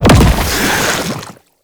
Ink Rifle Alt Projectile Impact Splash; Warframe; Tenno

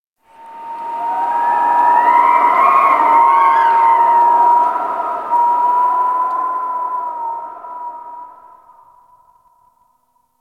Ice Cold Wind Whistle; Warframe; Corpus Ice Planet

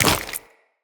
land flesh, footsteps; Characters; Tenno

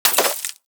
Bullet Impact Debris Glass

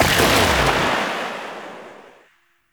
Shot Alt, Rifle; Warframe; Weapons; Thanotech; Trumna Prime

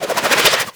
SMG Alt Projectile Deploy; Warframe; Tenno; Sundial SMG; Weapons

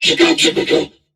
Surprised; Warframe; Corpus Crewmen; Crewman E; Dialog Barks Battle

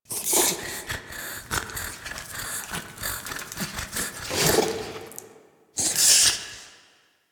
idle panting; Ambience; Warframe; Entrati; Tagfer

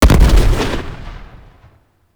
Bow Projectile Explosion; Grineer; Weapons

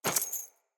Crate Coin Drop; Objects; Pickups; Neathuns

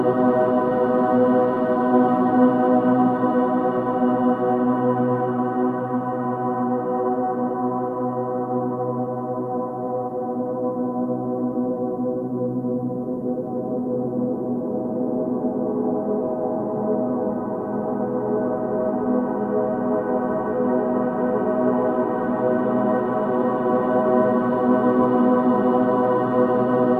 Hub Amb, Interior; Warframe; Ambience; Tenno

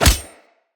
Unarmed Tempest Punch Impact Default; Weapon Impacts; Combat

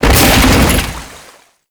Bodyfall Water, Foley; Warframe; Duviri; Dax; Body Falls

